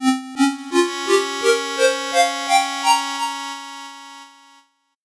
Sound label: 8bit jingle slow